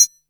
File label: Boss DR-660 Perc, Drum Machines